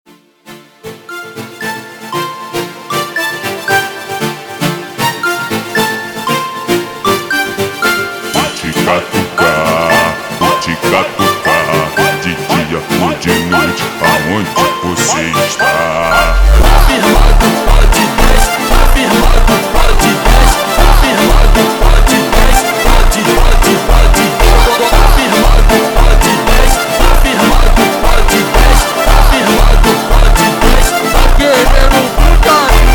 slowed, phonk music

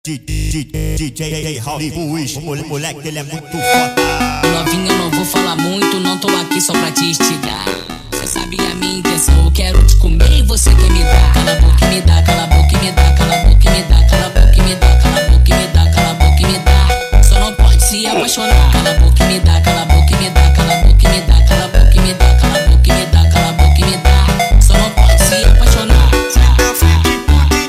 Melodia Cataclism, funk

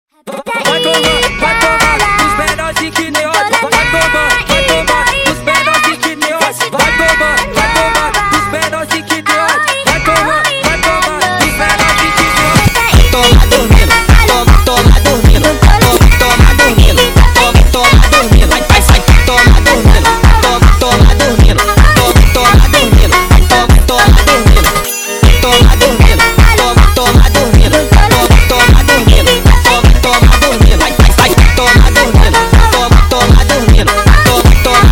Funk sped Up

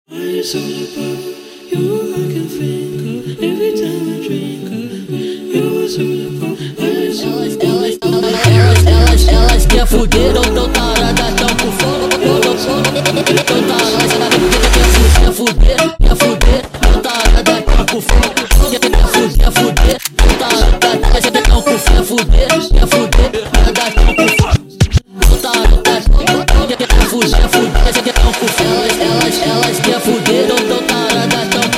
Funk sped Up

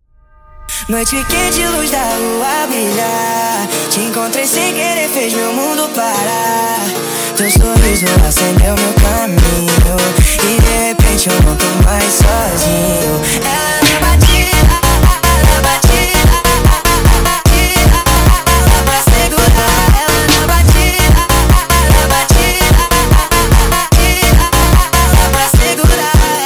sped up, funk

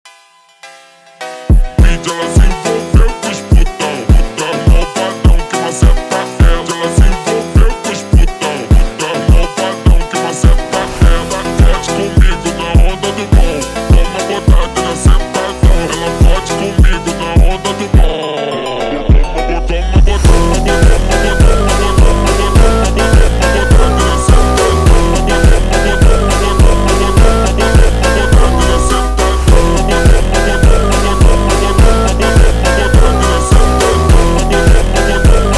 super Slowed, funk

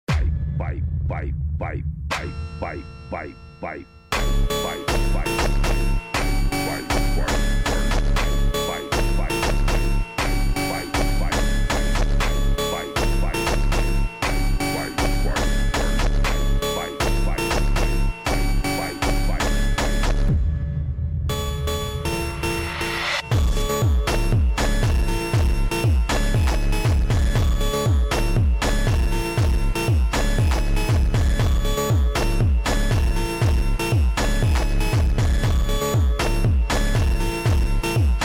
Automotivo slowed, funk

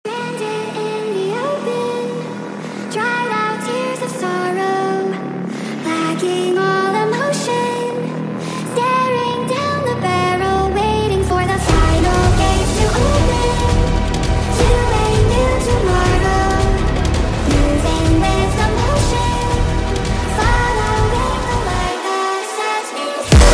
Funk sped Up

